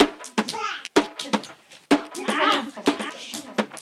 top loop 126; biriyani

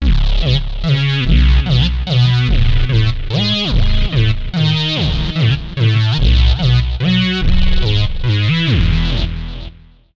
kurzweil k2700 fantasy

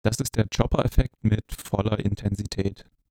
chopper; tascam ministudio creator us-42

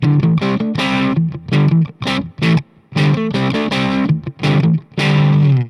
TAD Blackface 22 reverb

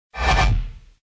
whoosh tube more control

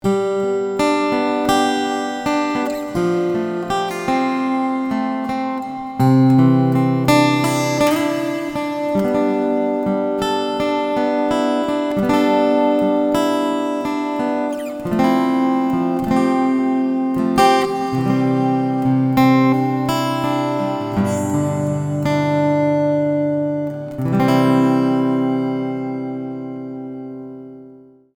Zoom A3 reverb plate

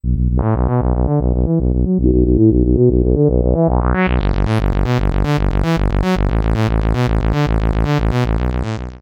kurzweil k2700 notches

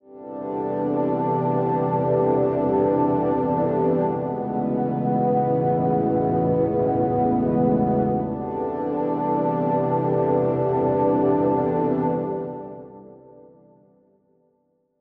pad; heavyocity gravity

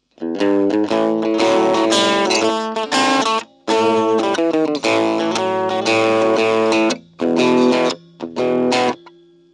bluesy

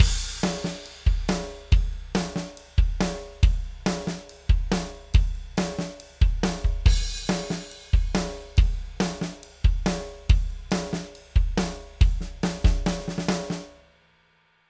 drums wet custom; presonus ctc-1 pro console shaper

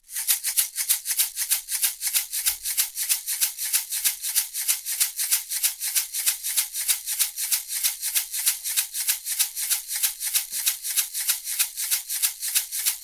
shaker; warm audio wa12

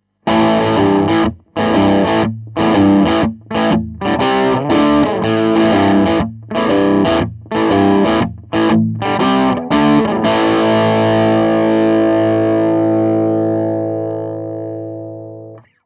les paul crunch

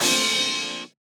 Hit

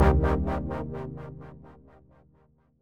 lfo filter

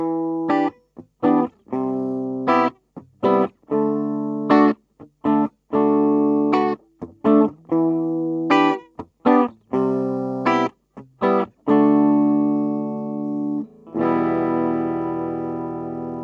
esp cln

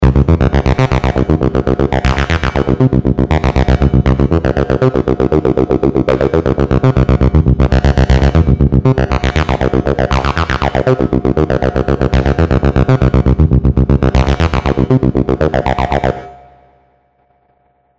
mechaverb chamber; vst plugin

